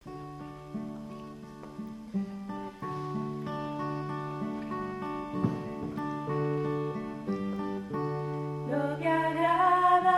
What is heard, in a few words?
Contraltos